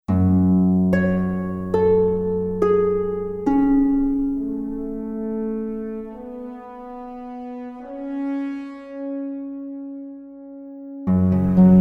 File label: Adventure Discovery Opening Sequence